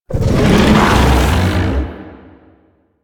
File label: Tank Infested Vocal Moan; Enemies; Barks; Warframe; Lasrian